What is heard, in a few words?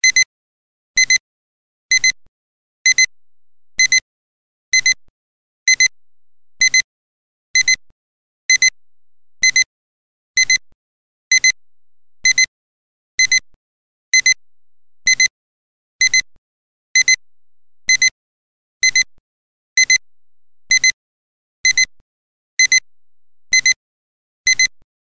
beep